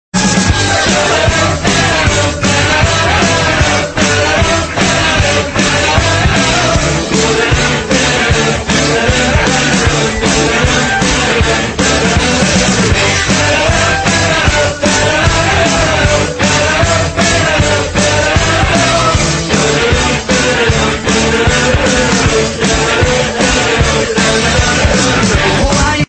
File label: ringtone; iphone 6 super